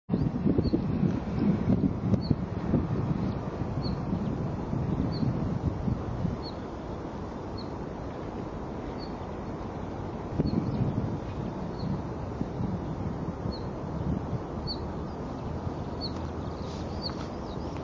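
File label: northern wheatear voice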